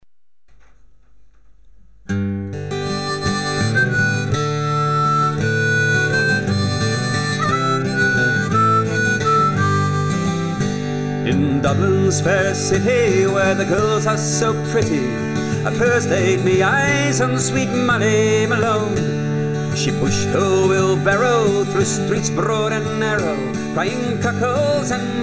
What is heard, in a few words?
Irish Traditional